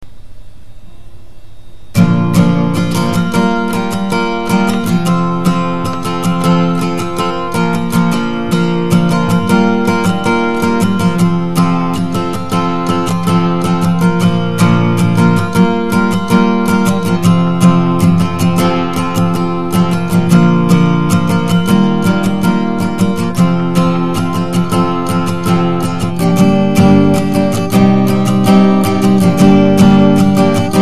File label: Pixie Guitar Tune